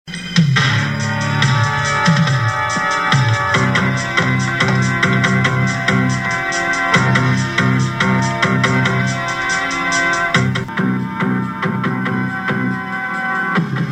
suspense theme

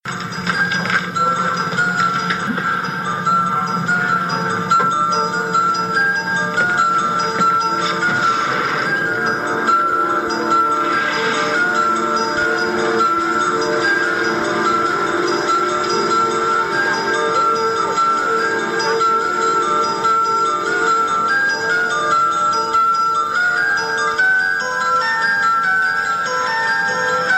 dramatic theme

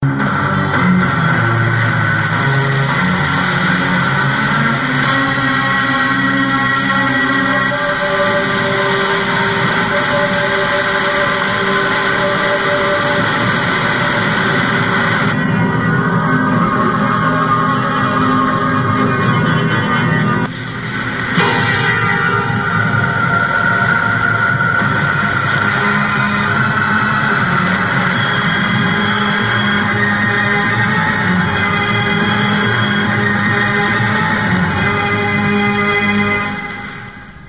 suspense

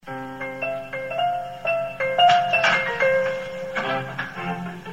scene ender comedy, instrumental

